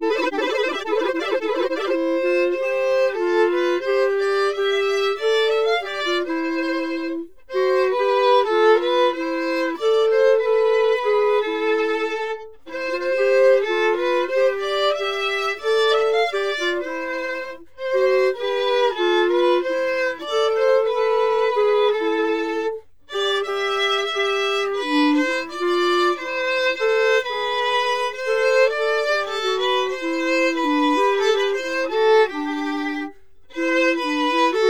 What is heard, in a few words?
Bach Chorale resyn; scorealign